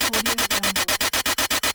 scifi spaceship interface mechanical survey machine loop